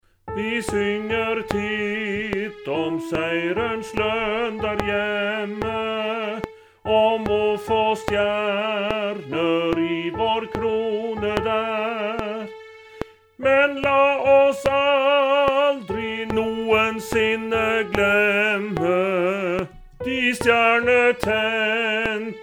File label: Tenor 2 click